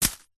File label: leisure hacky sack catch grasp hard